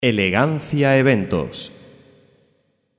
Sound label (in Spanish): jingle elegancia eventos